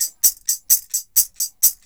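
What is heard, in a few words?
TAMB, tambourine; Drumdrops In Dub VOL-1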